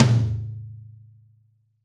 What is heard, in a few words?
Real Tom, Roland BK-5